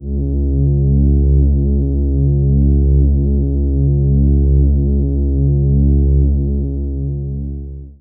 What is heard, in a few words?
drone